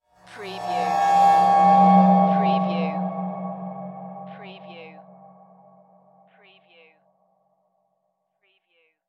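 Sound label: Production Element Cymbal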